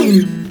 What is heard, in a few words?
Nylon Strm Down Up; Mo' Phatt; Digital Sound Factory Mo' Phatt